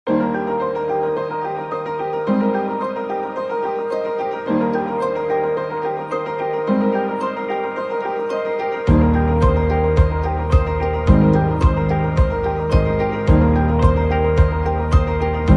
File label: Background music